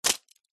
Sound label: household medicine tablet blister foil pack set down surface